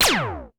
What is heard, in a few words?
laser beam phew